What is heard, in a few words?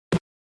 Fighting Land